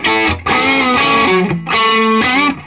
Vintage Blues Guitar, SOLO; USB Soundscan vol.22; AKAI; SOLO A125

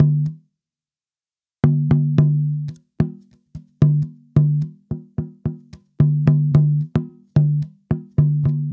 Tambora, African

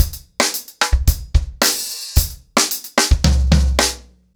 110BPM, ACOUSTIC DRUMS

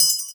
PERC R, Drum & Bass 160 BPM; Ueberschall; Drum & Bass Flash Trackz

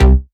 Basses Root C

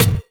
Percussions

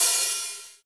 HH, KIT Real-Kit; 90 s Sample CDs; Sound & Vision - Gigapack I CD 1 (Roland); Real-Kit s11

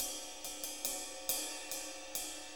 6-8 Ride, HiHat & Ride Loops

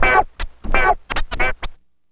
GTR SCRAT R, Funky GtrLicks; E-MU Formula 4000 Series Vol. 1; Hip Hop Nation

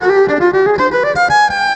EVIOLIN, ELECT VIOLIN; Best Service; World Colours

